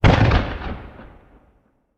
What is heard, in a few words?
Explosion Firework Ignition Close; Fire and Explosions